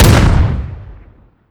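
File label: alpha50 fire player, weapons; gmod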